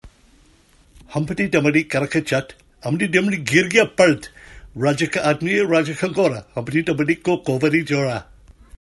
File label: humpty dumpty urdu